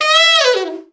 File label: BRA ALT FALL, SAX; Best Service Gigapack 1